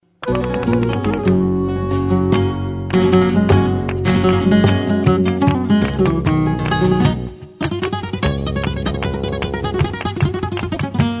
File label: salinas, gitarre flamenco